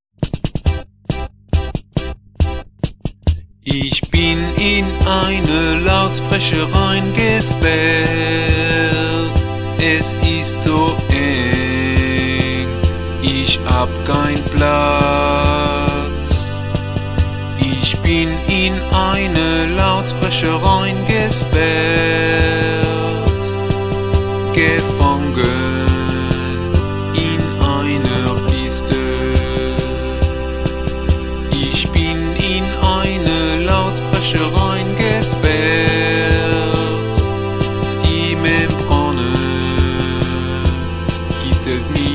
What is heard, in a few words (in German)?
musik lautsprecher